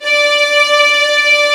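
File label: Vio legato D4; Digital Sound Factory Virtuoso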